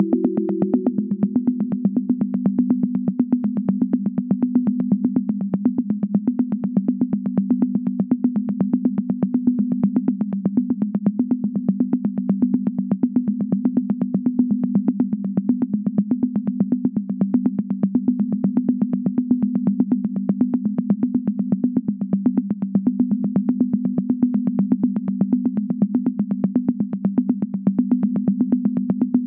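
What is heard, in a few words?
122 BPM Sequence C major A minor Tonic G; innovative sequences 1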